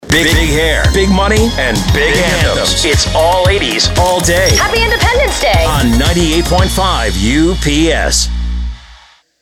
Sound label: All 80s Sweep